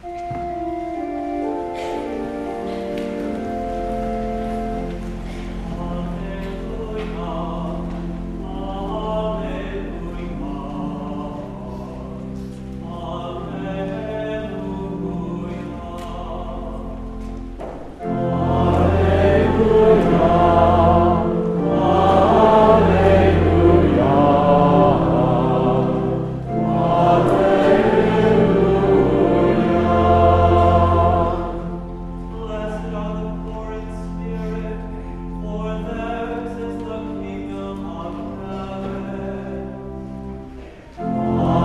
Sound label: Gospel Acclamation